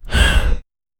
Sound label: man inhaling and exhaling; breath